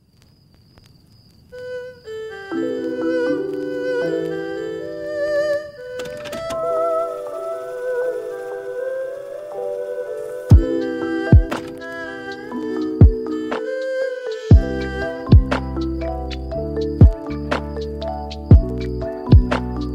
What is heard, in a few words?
lofi hiphop